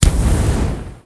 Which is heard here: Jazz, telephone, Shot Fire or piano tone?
Shot Fire